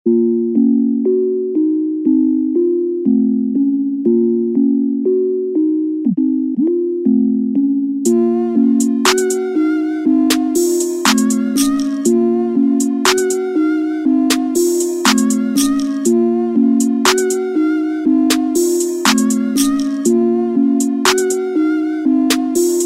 start round, music

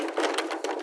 metal stress

